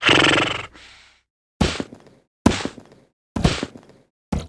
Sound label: Okto Carriage idle; Seer; GamigoNA